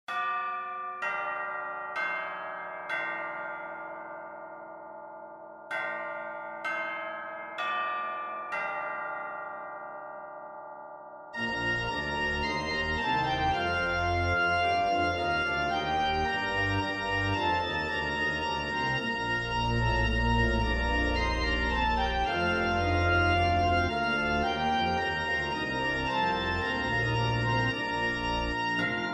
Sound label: Ding Dong Merrily On High - Brass